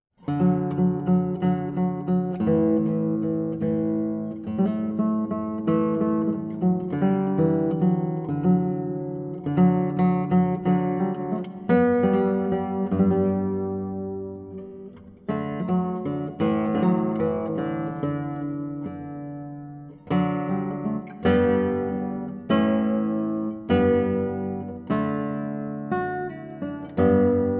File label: guitar; Solo Guitar Compositions